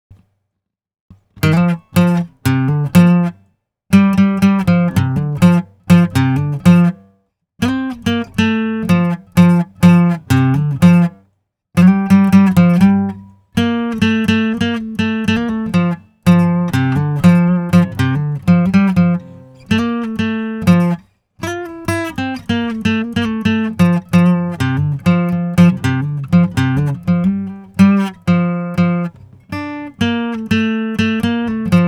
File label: little guitar sample